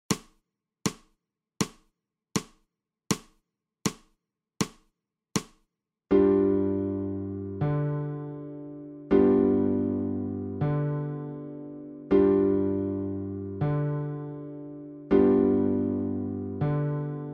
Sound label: ALTO